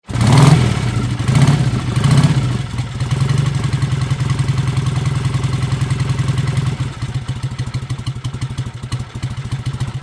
transportation motorcycle rev idle 1954 triumph thunderbird 650cc; Motorcycles; Vehicles; Motorcycle Rev Then Idle - 1954 Triumph Thunderbird 650cc; McKinney Sound